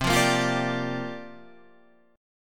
C 7th Suspended 2nd Suspended 4th C down Guitar Half Step Down